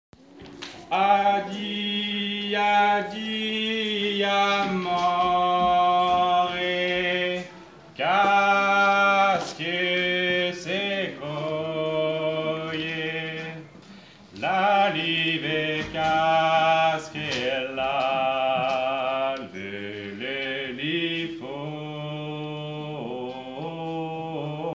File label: LEAD; Cade luliva addije